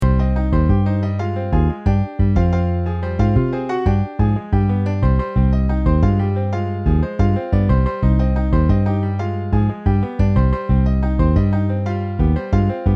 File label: Piano Bass